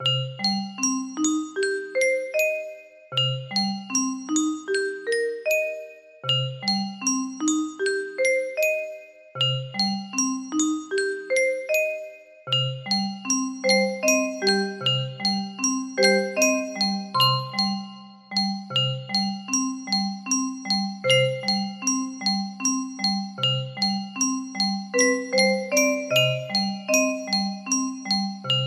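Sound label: piano partial, music box melody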